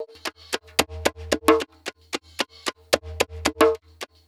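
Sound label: PERC 1, 112 bpm, Funk; USB Soundscan vol.41; AKAI; Roots Funk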